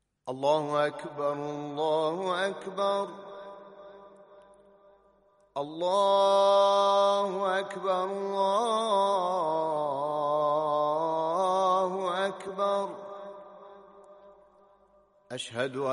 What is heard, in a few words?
azan